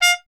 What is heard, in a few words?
TP G; Miroslav Vitous; Solo Instruments VOL-1